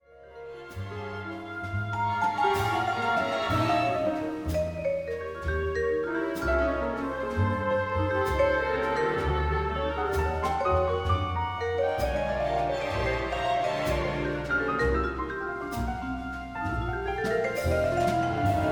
concertino for jazz quartet and orchestra passacaglia